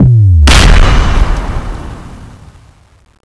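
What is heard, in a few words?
explosion, weapons; gatling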